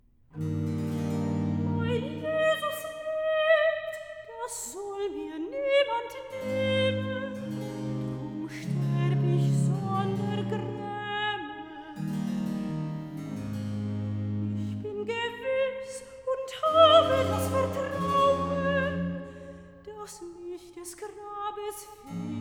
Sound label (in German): Recitative Mein Jesus lebt (Sopra; Ich lebe, mein Herze, zu deinem Ergotzen, BWV 145; Mein Jesus lebt (Soprano)